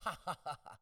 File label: man haha; angband